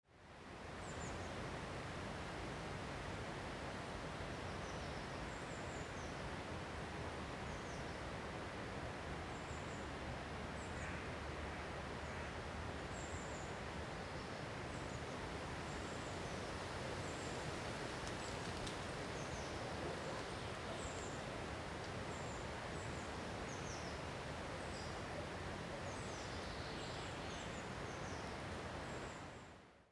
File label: Soundscape; mYcard